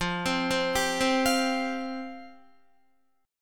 F Suspended 2nd F Guitar; Fsus2; arpeggiated

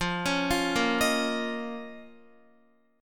F 7th Suspended 4th Sharp 5th F down Guitar; arpeggiated

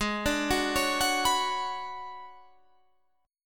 B Minor 6th Add 9th Ab

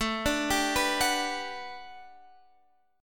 G Major 9th, A; GM9/A arpeggiated; JGuitar

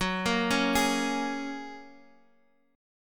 Gb Major Add 9th, down

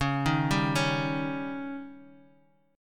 Db Suspended 2nd Flat 5th

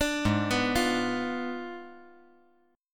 G sharp Diminished 7th G sharp

